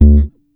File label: HEY HEY BASS; Sampleheads - Ultra Freakin' Bass